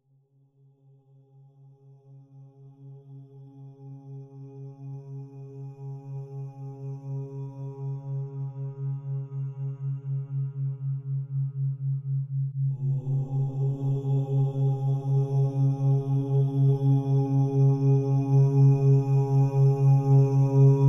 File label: OM Chanting (136Hz), Meditation binaural; Binaural Hybrids